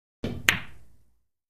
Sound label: Billiards Ball Hits